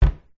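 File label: household bathroom cupboard door close thud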